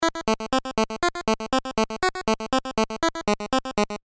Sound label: dpcm